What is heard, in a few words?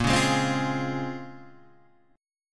Bb Minor 9th Bb, Guitar, Standard; Bbm9; strummed; 6,4,6,5,6,4; JGuitar